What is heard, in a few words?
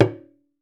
African Drums; DON-DON MU; E-MU Formula 4000 Series Vol. 4 – Earth Tones